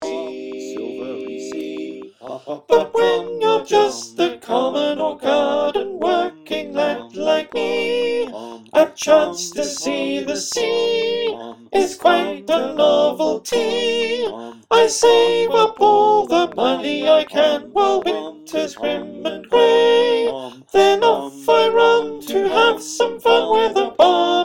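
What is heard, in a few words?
verse parts soprano, choir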